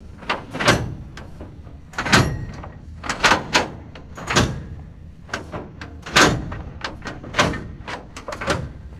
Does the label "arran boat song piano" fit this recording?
No